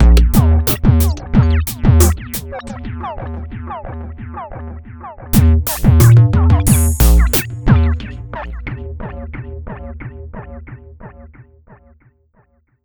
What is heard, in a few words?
Perc